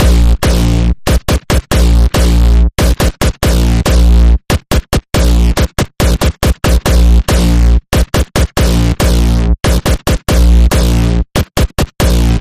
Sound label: Pulse Of The Machine, A sharp